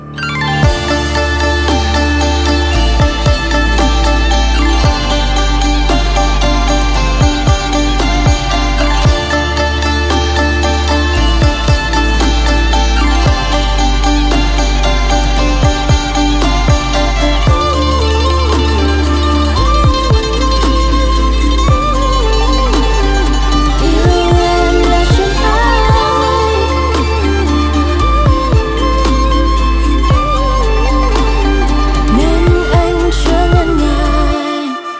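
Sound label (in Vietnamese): Nhạc Chuông Melody Đoạn Cuối, Nhạc Không Lời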